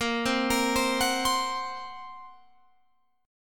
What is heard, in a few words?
Bb Suspended 2nd Sharp 5th, Guitar Open F; arpeggiated